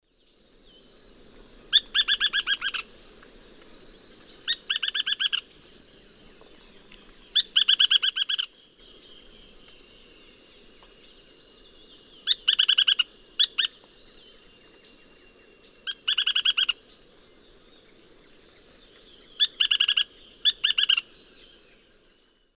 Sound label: Synallaxis albigularis, song; S. America; Eastern Lowlands